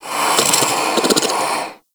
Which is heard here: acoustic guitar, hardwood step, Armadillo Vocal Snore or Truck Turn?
Armadillo Vocal Snore